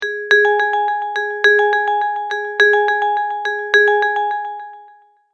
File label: Multimedia Tone-Thinking/Processing